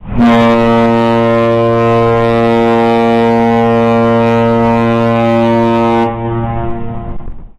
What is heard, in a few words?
cruise ship